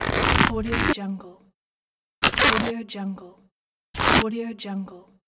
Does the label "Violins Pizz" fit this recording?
No